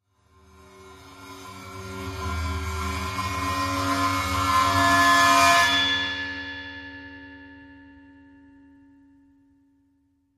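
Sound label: Splash Cymbol Bowed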